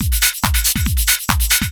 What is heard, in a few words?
TECHNO BEATS 140